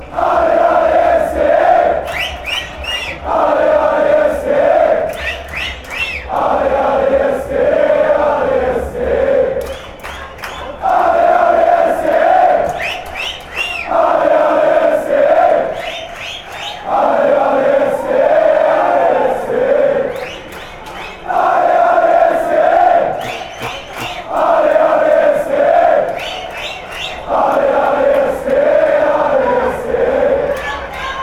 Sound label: allez, fan chants; sge